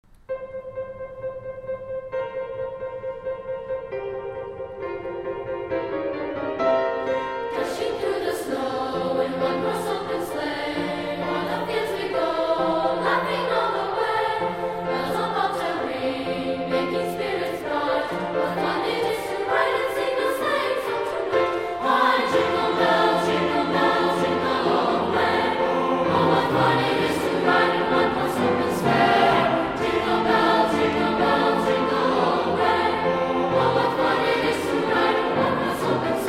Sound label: Jingle Bells